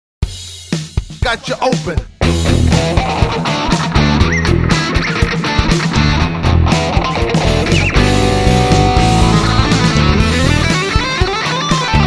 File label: Funky Groove